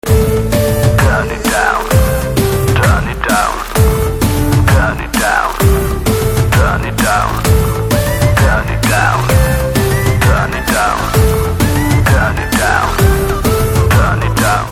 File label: BGM Ringtone